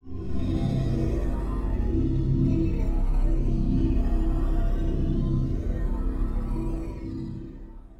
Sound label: Dark Synth, cinematic drama soundbeds; musicradar